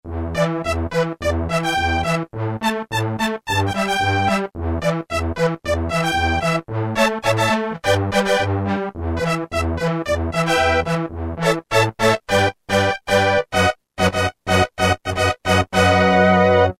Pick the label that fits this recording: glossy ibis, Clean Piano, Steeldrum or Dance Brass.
Dance Brass